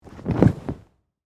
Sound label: Body Fall Pad, Gymnastics; Sports; BlastWave